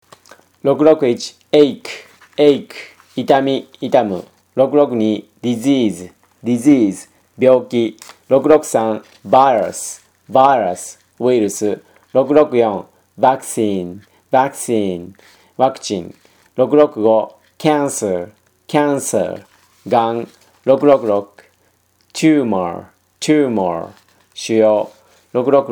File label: tango